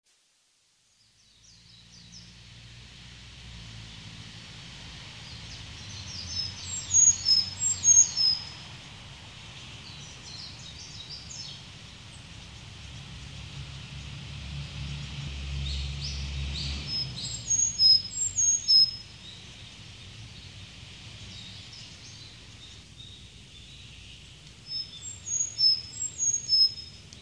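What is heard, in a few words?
Sakhalin Leaf Warbler; kokubunji; koe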